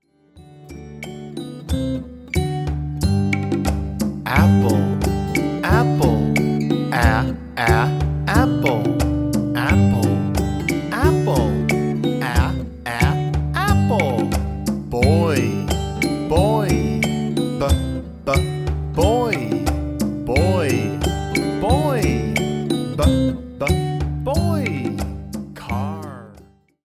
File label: Toddler Phonics; kids songs lets take walk